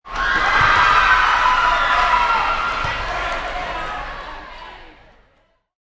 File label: Basketball Crowd Cheering, Indoor sports: Basketball game; Basketball Crowd Cheering 04. Indoor sports: Basketball game. Crowd cheering, excited. Sound of players moving on court.